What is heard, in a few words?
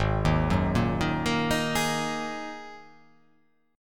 G sharp 7th Suspended 2nd G sharp